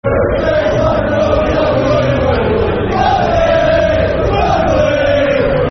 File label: Wembley, Football Chants (Soccer Songs); Blackburn Rovers Brfc